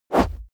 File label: swoosh close; swooshes-swishes